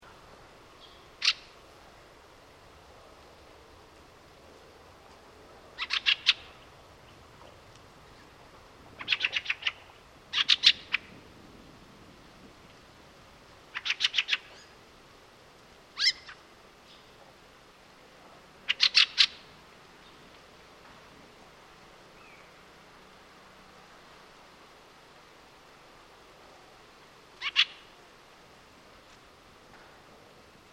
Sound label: Fieldfare